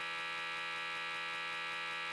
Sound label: hum loop, laser trap; weapons